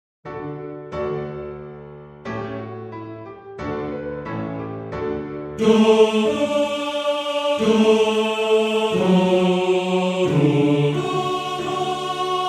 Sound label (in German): Tenor; Die Ehre Gottes; Tenor 1